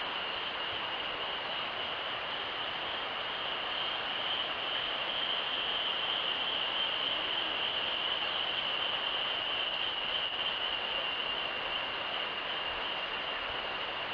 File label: jungle; palau